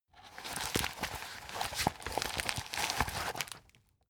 plastic bag handle aggressive

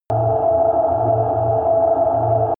underwater noise deep

